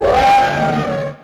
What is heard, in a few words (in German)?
Elefant